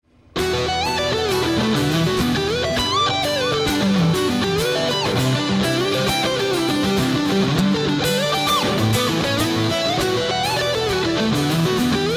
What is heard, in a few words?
sweep